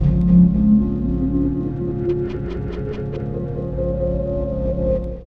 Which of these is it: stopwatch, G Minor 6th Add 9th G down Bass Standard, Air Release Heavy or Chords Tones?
Chords Tones